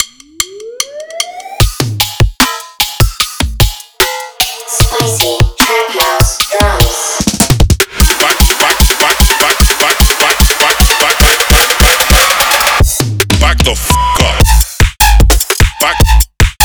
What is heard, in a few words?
Spicy Trap House; Disciple Samples; Eliminate; Modestep